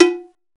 Cowbell, Roland S50; Drum Samples

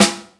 Funky sd, Roland MC-303, Snaredrums; Drum Samples

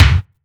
Bassdrum Gated, Akai MPC-60; Drum Samples

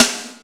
Snare, Yamaha RY-30 SNARES

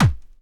Hard Kick

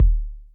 kicks, Bass Drum

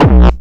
bombo, kicks, Hardcore

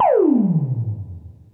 Zap

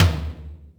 Yamaha RM 50 Toms; Drum Samples

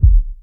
Cave bd, Roland MC-303, Bassdrums; Drum Samples

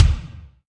Kik Hall, Bass Drum Kicks

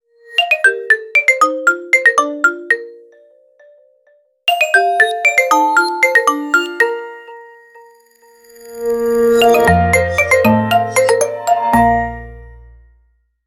NOKIA DEFAULT RING; Nokia Ringtones

